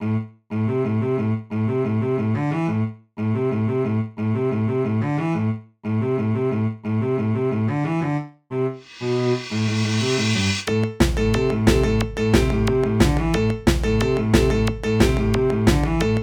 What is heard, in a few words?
string beat